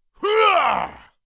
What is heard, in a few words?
Orc creature attack